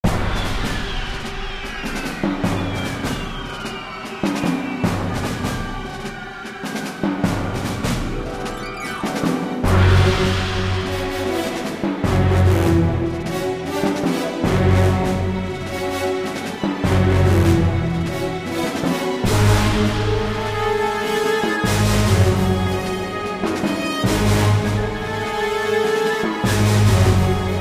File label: battle; starfleetcommand3; kli